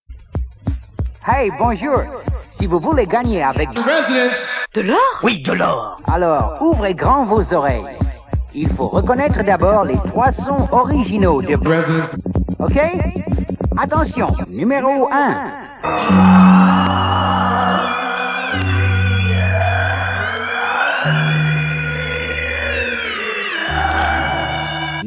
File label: Jingle